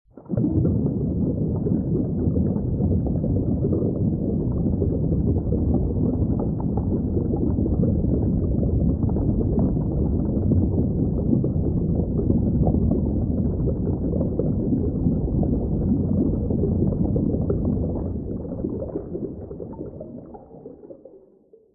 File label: underwater ambience